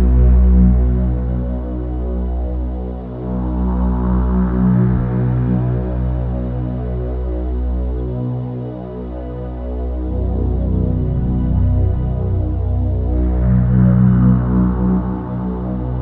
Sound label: Drone; Discovering Space 2 VR